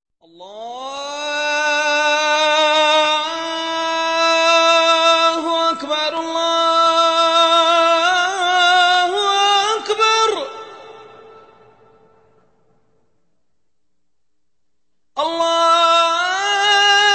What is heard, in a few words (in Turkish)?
Ezan